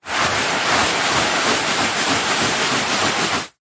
household cleaning wiping carpet with dry tissue paper circular motion; zapsplat